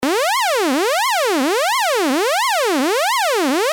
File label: LFO